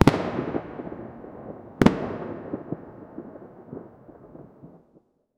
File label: a firework explosion lighting up the night sky; festive